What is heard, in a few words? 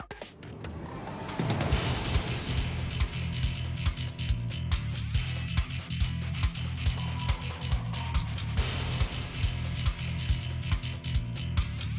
Thinking; The Missile Maestro